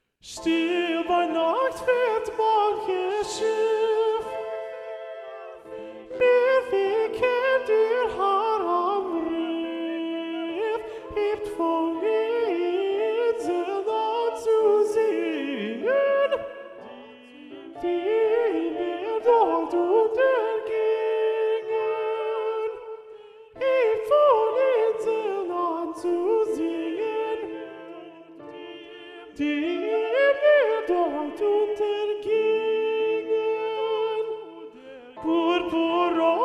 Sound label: Alto 1 Predominant; Womens; Robert Schumann, ed. MacMullen